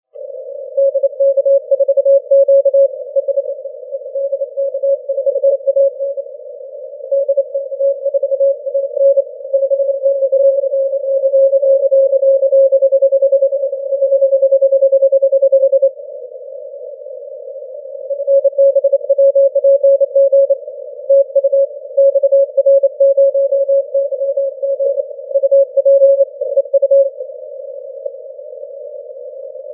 cw; 30m

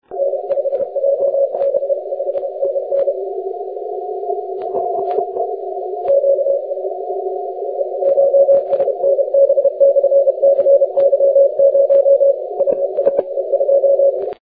30m cw

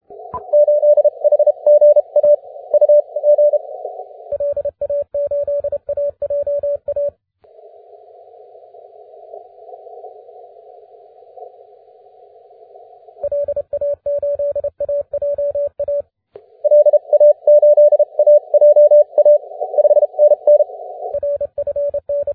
20m cw

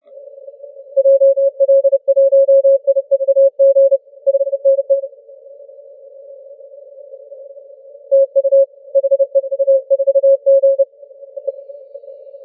20m cw

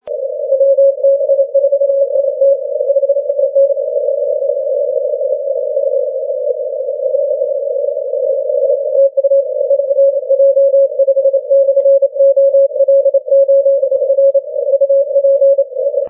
80m cw

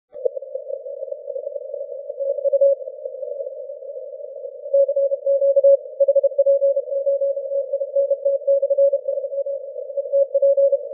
30m cw

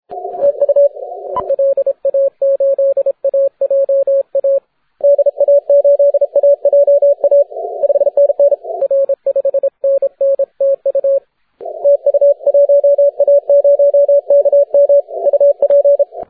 40m cw; 1a0km

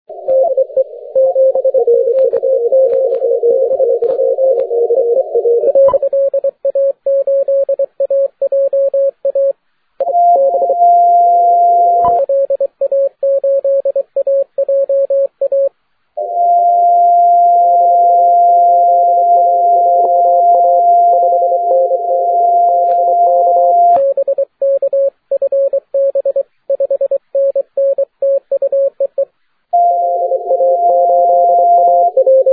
10m cw

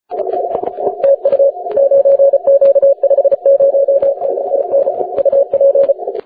40m cw

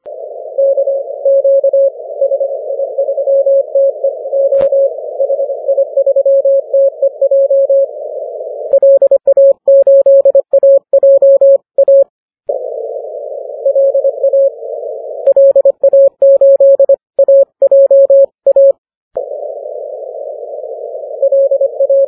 6m cw

